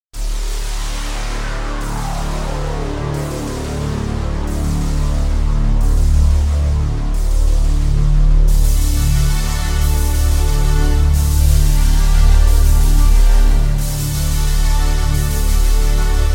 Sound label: landscapes teleportation